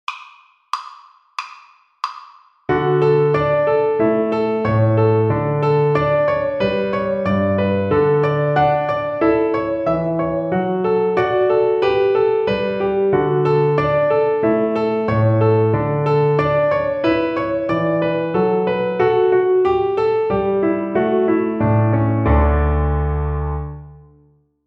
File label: String Gym, Piano Accompaniments; Etude 19 - Tuning our F# and G Buddies